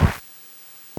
Clap, NES DPCM

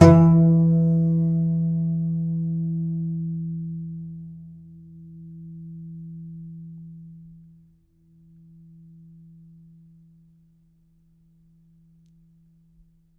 C. Bass Balalaika, Plucked Strings

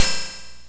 cymb, cymbals

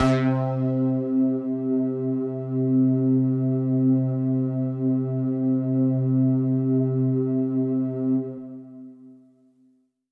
Pad C, Synth Prophet One Shots; Electronisounds

